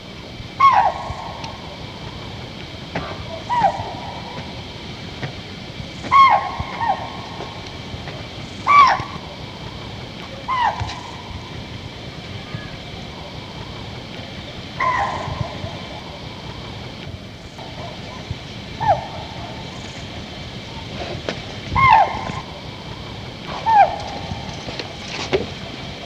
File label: chital alarm call, Dudhwa NP